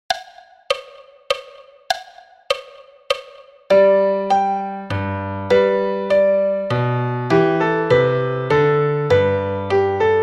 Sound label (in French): Piano a 100 bpm